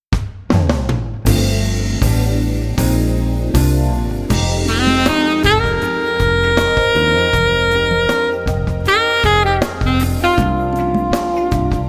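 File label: Soloist Alto, sax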